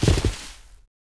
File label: Horse Run Forest; Civ4 warlords